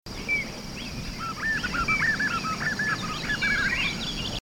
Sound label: White-eared Puffbird; S. America